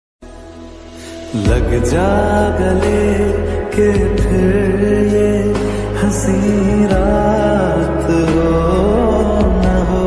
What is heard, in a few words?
song slowed reverb; hindi song